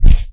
Punch; WoWInterface; WeakAuras; Batman

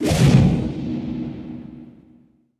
Warning; WoWInterface; Details

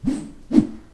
Double Whoosh; WoWInterface; WeakAuras

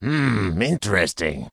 report; WoWInterface; AllTheThings

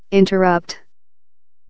Interrupt; WoWInterface; MRT

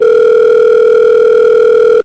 Ringing Phone; WoWInterface; WeakAuras

